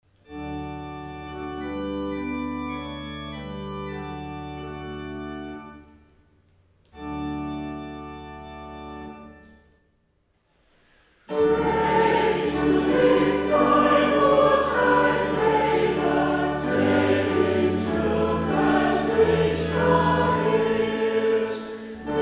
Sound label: Choir, hymns